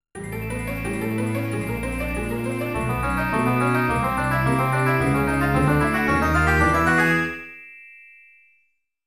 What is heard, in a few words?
Open Treasure Box